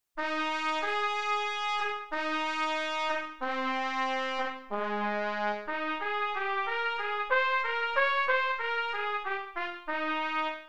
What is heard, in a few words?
Trumpet